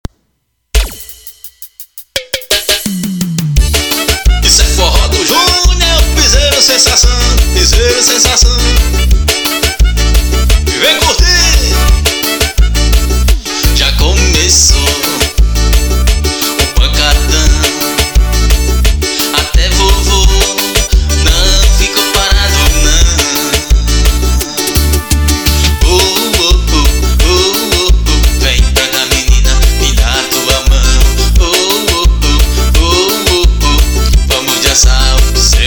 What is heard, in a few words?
forro piseiro